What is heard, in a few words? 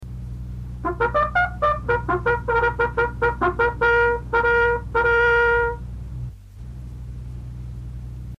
Flight Quarters, Bugle calls